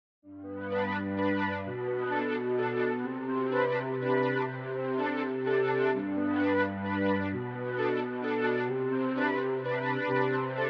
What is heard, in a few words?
Mellotron